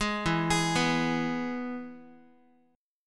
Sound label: E-Major E, Guitar; arpeggiated; JGuitar